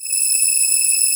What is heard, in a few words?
AIR VOX E6, VOICE; AKAI S-Series CD-ROM Sound Library VOL-7